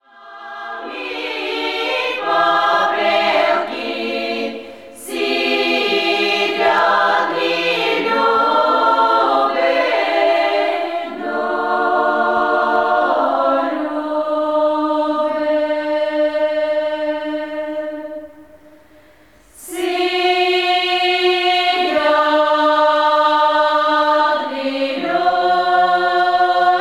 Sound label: female choir